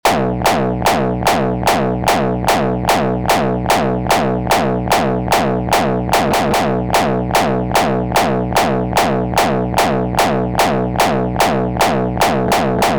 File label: Kill The Bass Wet; distoblast